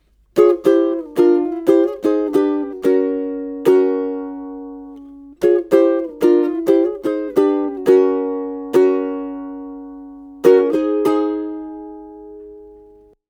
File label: Solo Riff, ukulele